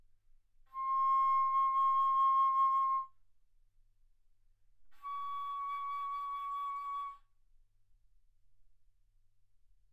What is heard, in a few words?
Bass Flute C6 Db6; ff